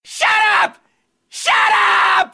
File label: LOUD SHUT TWICE; anoying-short